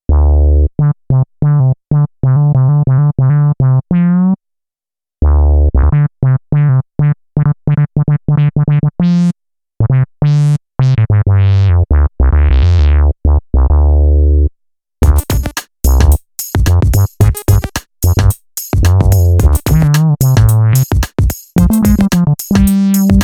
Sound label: Urban Bass, Korg Radias-R